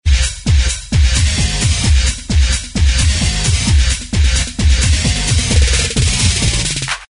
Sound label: rings rave on